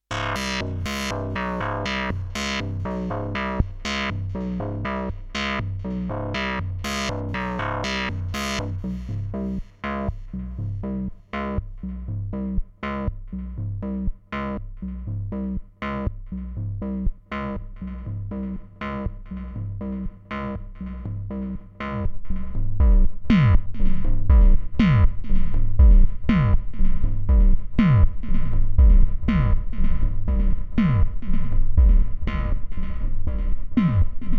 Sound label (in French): lightwave modif son; plugiator